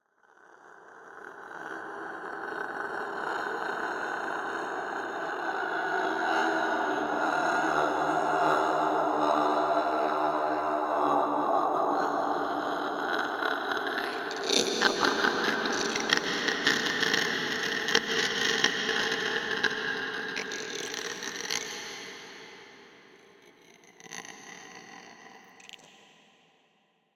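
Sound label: ambience China 3D ambience; rabbids multiverse; iOS, macOS, Mobile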